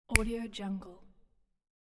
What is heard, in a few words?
finger snap